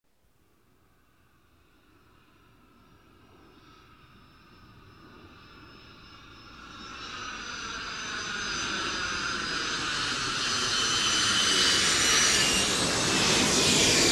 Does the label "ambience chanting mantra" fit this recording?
No